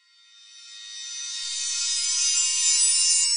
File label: Reverse Fx; musicradar